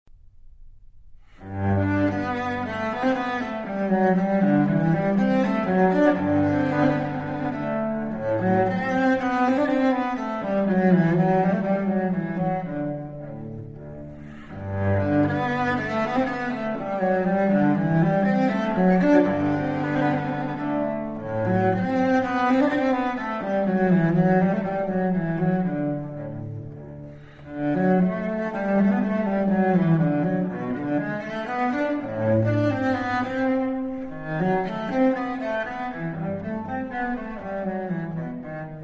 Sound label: Cello Menuet G Major; Cello Suite No1; BMV1007; Menuet I II